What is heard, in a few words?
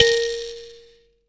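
kalimba A#3, ETHNIC; AKAI S-Series CD-ROM Sound Library VOL-8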